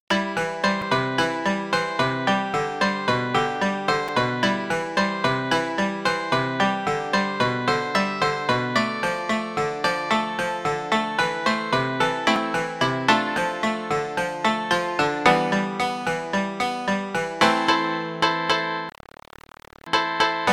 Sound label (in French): voyage asian